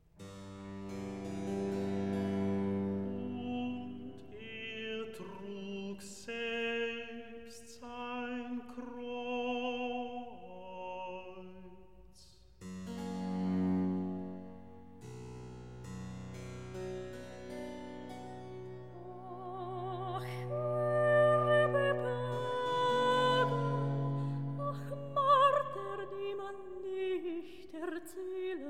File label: Recitativo; evangelist, Tochter Zion Und er trug selbst sein Kreuz; Klassika; Handel Masterworks